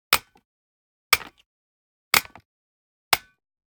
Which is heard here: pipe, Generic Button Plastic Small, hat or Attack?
Generic Button Plastic Small